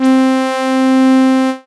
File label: Circuit Brass Synth; Ableton; Factory Packs; Synth Essentials; Analog